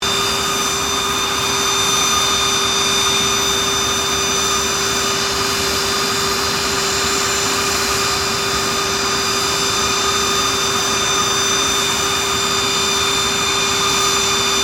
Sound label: Cessna Citation CJ3 idle close; Airplanes; Vehicles